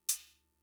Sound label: Cymbal, Fricke MFB-522, Drum Machines